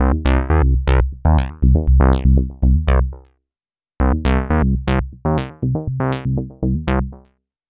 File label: Bassline, Prototechno